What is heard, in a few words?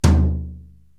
Tom-Brush Floor; Korg TR-Rack